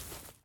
grass step; footsteps

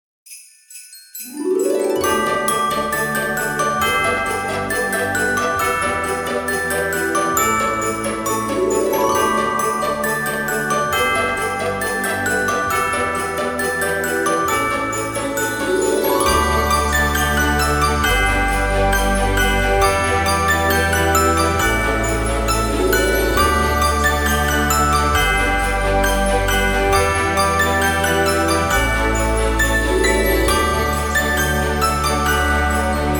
menu music winter

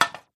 porcelain hit; hits

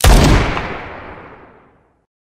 m1895 fire; weapons

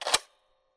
mp40 switch; weapons

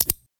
helmet pickup

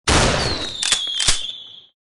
firework launcher fire; weapons

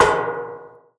large refinery barrel hit; hits

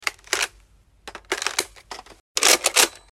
usas12 reload, weapons

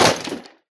wood destroyed; hits

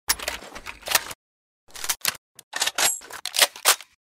stoner 63 reload, weapons

